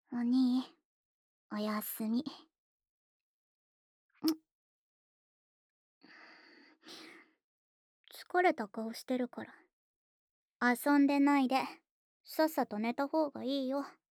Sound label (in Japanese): 『おやすみのチュー』